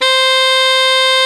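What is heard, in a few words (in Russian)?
саксофон одиночный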